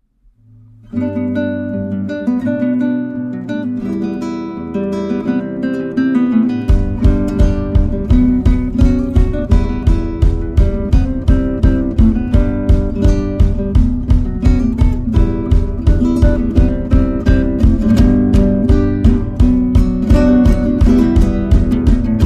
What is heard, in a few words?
acoustic